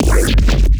Growl Bass; neuro; BIG5AUDIO

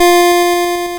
F4, LSDJ Notes; LIMAT LSDJ kit

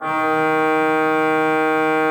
D3, Cathedral Organ; ILIO; Propeller Islands

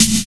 high octane; Drums In Your FACE!

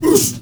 MALE WAR, Heart of Africa, shout

